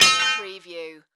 Barbell Drop Impact; sound effects bible

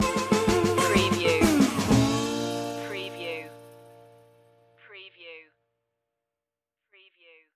tom foolery stinger

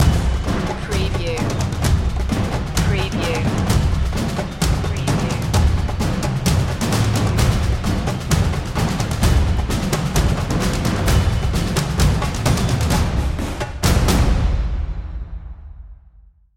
storming percussion

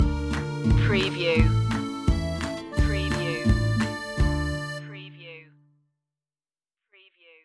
russian romance stinger

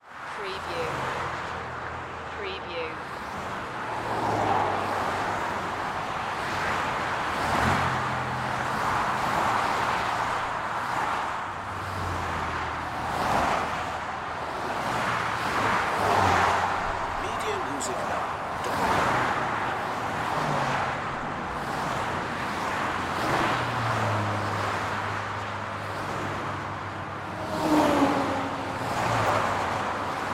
Traffic Highway

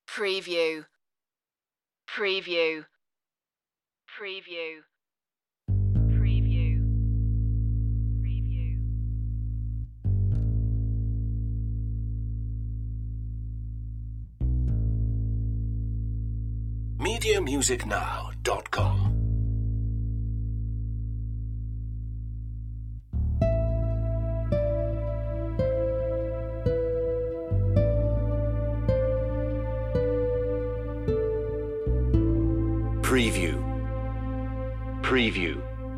suspicion bass harp and strings

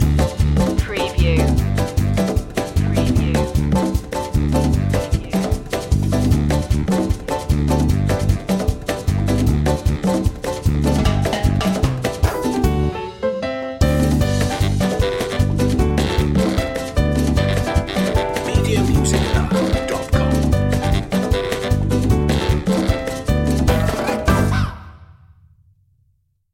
merengue underscore

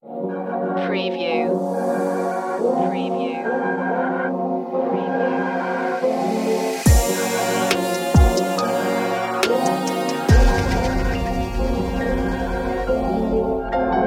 smooth soul vibes no vocals

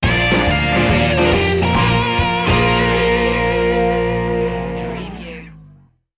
feelgood blues sting

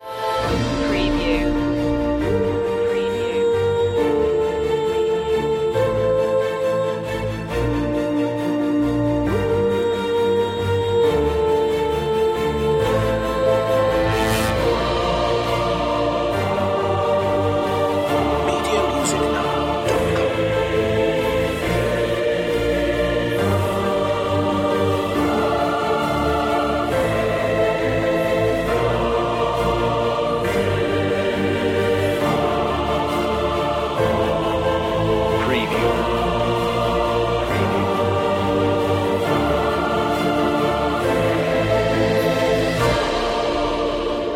the fountain of life no drums

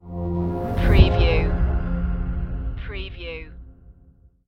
mournful regrets sting

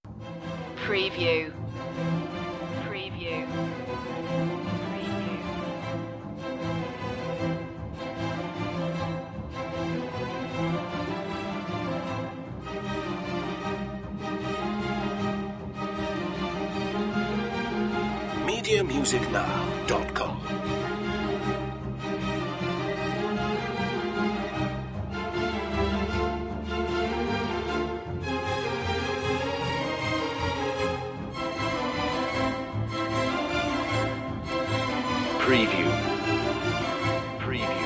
storm riders strings french horns chamber mix

